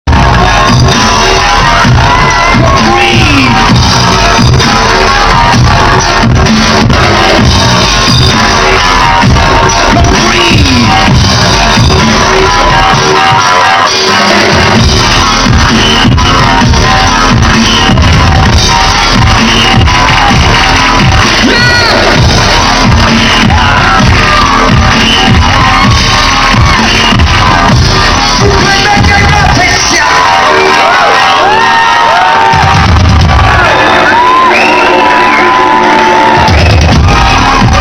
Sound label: dubstep, Live; Live At Liverpool Carling Academy '08